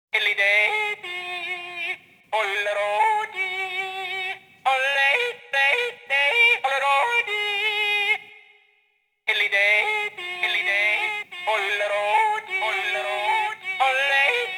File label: Seattle Yodel